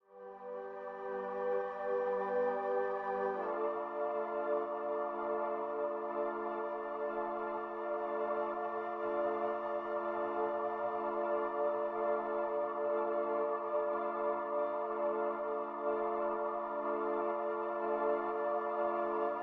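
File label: Synth strings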